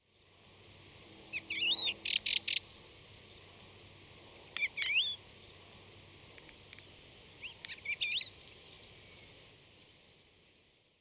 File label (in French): cochevis de thekla